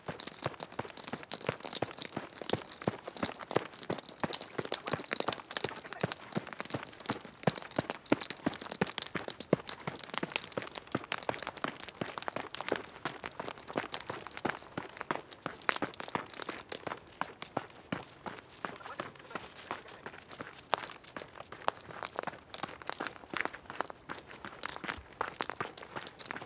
courir aveugle

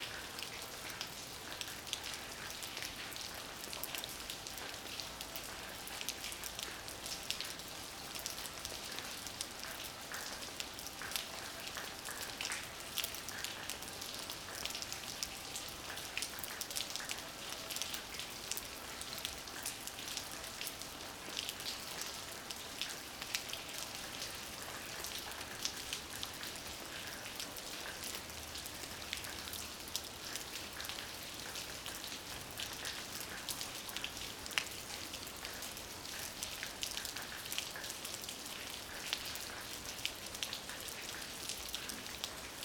pluie oplontis

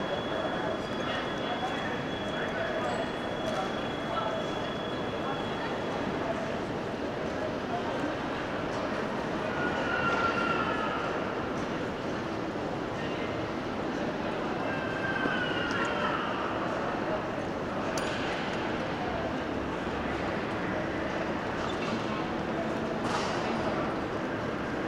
aeroport marrakech

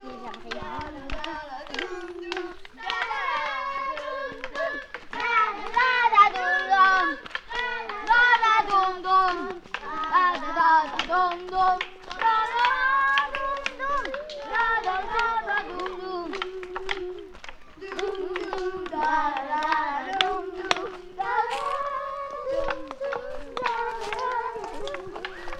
rando; premiereclasse

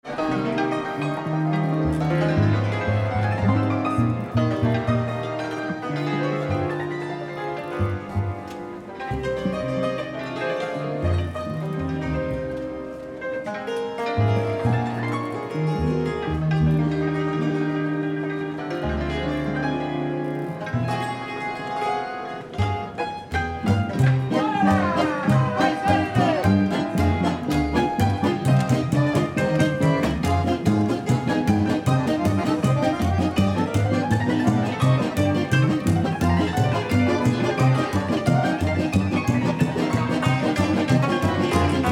cymballum

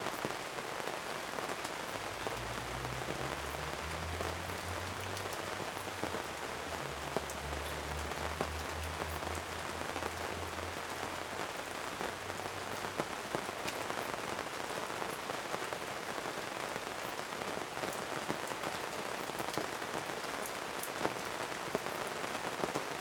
pluie parasol

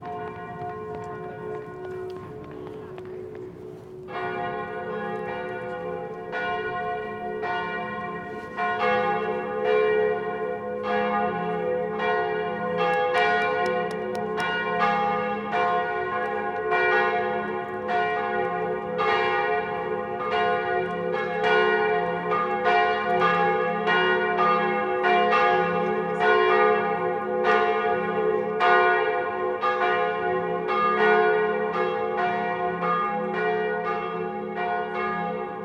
assise cloches

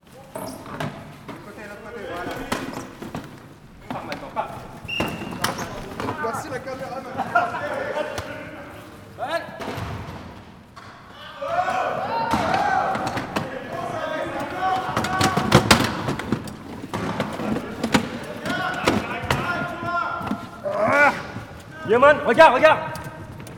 rugby fauteuil

